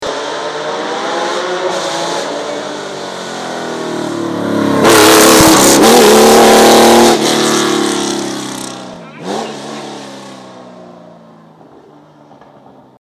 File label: porsche; Altbueron 2011